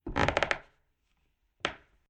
Household Old House Footstep On Wooden Floorboards Creaky Squeaky Eerie; Creaky Door Sound Effects